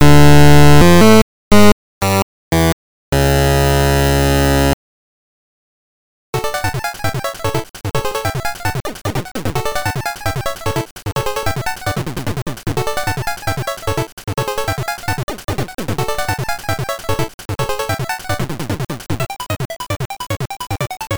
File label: Boss Battle; super mario land 2 six golden coins